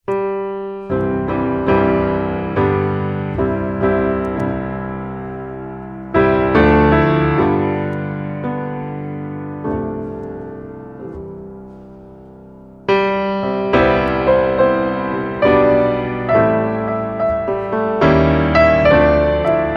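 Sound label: Oh Tannenbaum, without Jingle Bells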